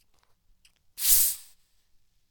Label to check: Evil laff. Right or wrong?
Wrong